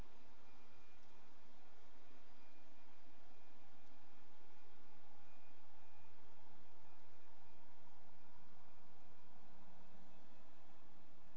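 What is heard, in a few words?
hydrophone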